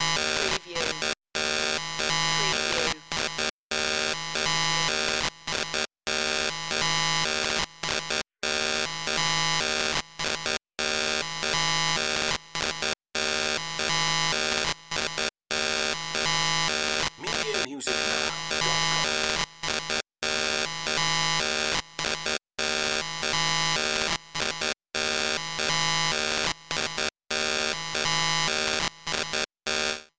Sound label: Computer Readout